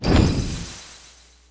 crystal beam

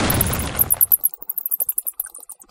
scarab

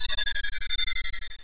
distort

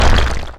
dig

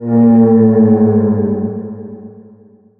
giant

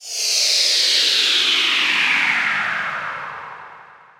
melt

